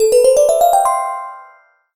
completed

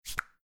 receive

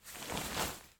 cloth Remove; spellbound

